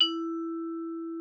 XYLS E4, VIBES; Xsample VOL-4

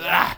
"OOOOAAAAH", VOCAL

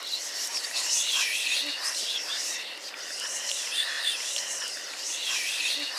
WHISPER; WHISPERS

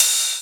Rides, Cymbals

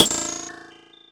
HH CLOSED, Disco House

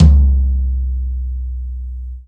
TOM, Drum Dry; East Collexion; Drum 1

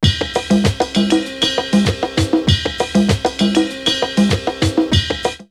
drumloops OldSchool 98; X-Static Goldmine

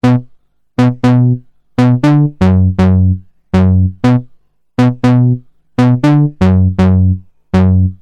Bass d5; Industrial

